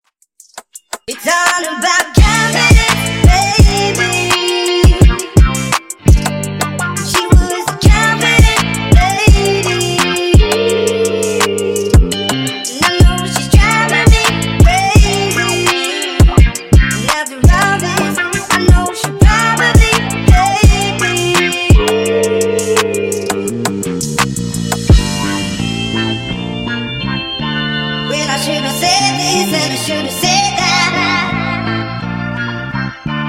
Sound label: Funk, 84Bpm; Redrum